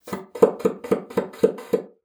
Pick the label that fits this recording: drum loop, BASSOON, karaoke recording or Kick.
BASSOON